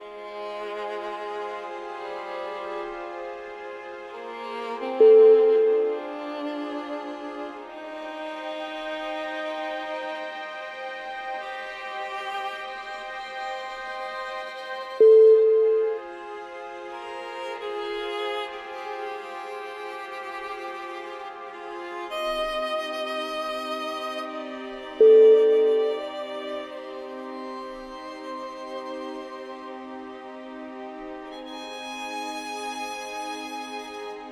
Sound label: an emotional heartfelt plea